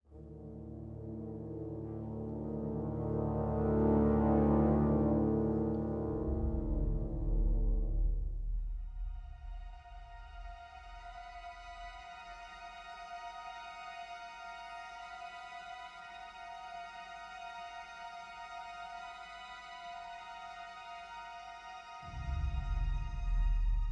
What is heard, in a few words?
Ambient Idea Nonloop